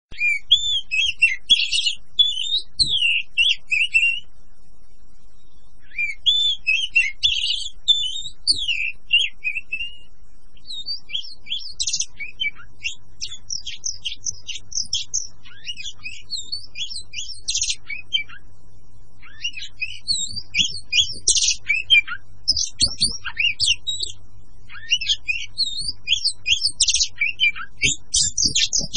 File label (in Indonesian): kicau burung kacer; ringtones